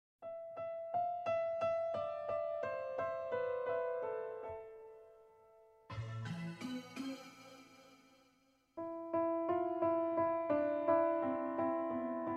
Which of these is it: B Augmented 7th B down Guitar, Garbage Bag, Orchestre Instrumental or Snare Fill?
Orchestre Instrumental